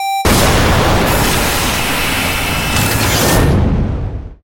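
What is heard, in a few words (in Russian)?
звуковой сигнал перед мощным подготовленным взрывом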